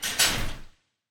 jail door close

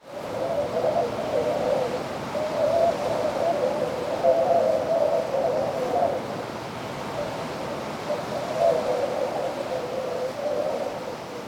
wind

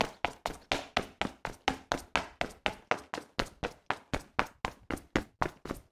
dash school

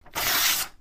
paper tear

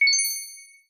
decision, button

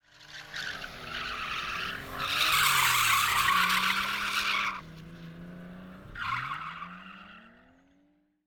car sudden curve

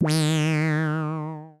stupid

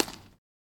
ball mitt catch

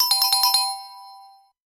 correct

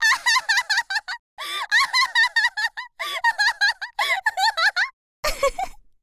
girl ahahaha; voice

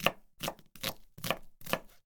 kitchen knife cut

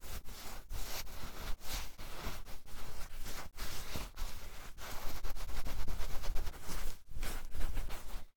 towel wipe body

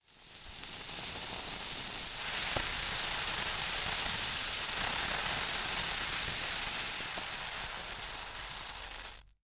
fireworks hand

